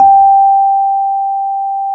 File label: E PNO; Synclavier Sampler Library